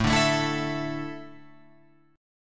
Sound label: A Suspended 4th down Guitar Open F; Asus4 strummed; JGuitar